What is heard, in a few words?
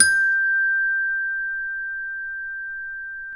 CEL, ORCH PERC